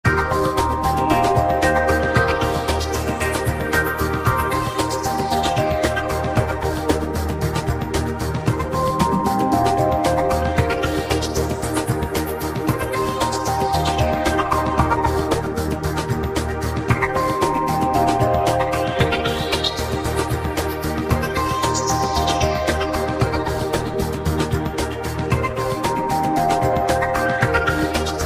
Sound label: lounge music ringtone